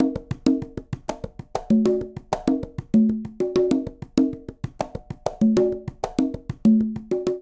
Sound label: Fusion 3 4 Quinto Conga Tumba 97bpm, Latin Percussion; Ableton; Factory Packs